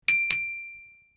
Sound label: Subscribe bell ding; shorts sound effects